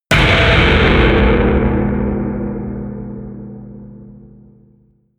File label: distorted metal, metall; hits explosionen